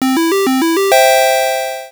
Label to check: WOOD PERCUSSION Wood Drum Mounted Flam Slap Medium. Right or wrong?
Wrong